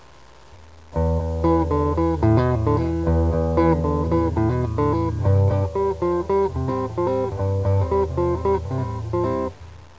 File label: funk